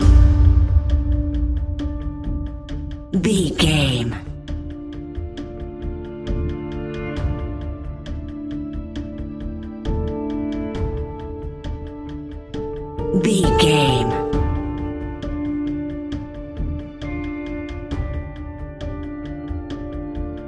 dark pursuit tension, A cool piece of electronic music perfect for the nightclub